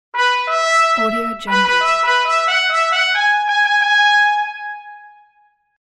trumpet

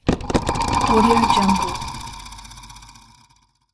mechanical belt failure

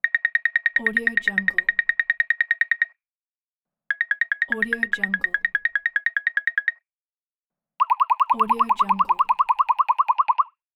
cartoon running

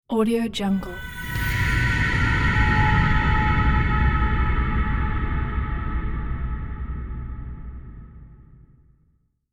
horror transition

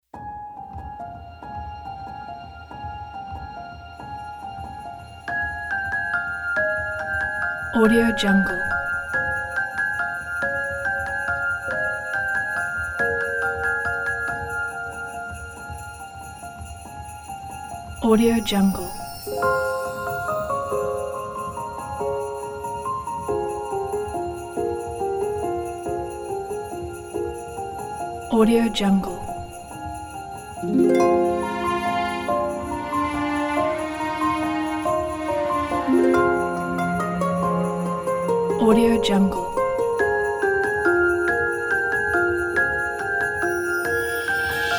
christmas carol of the bells

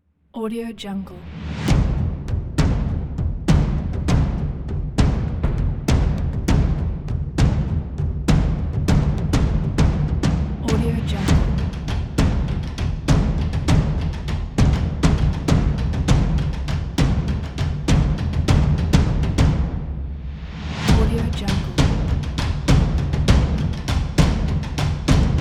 taiko groove

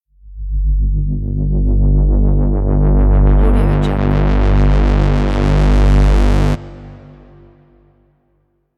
dark swell

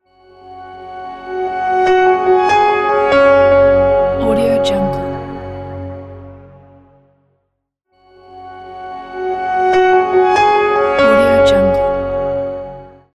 piano ident

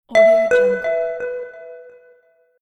long notification

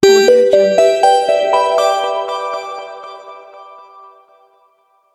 minimal logo

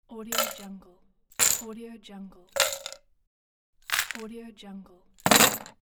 falling metal items

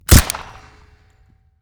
sig p226 suppressed single shot dry; soundmorph

